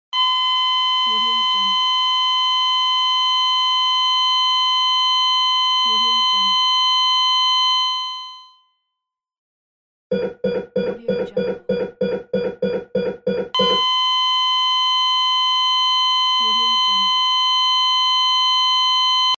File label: flatline heart rate monitor